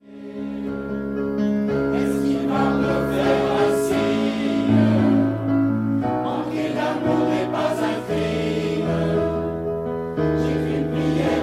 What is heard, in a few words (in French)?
si seulement concert